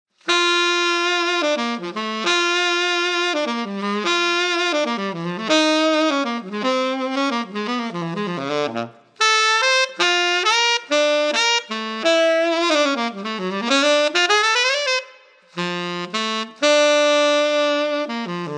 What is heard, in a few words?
Ten brass all reg; Ten Roma brass all reg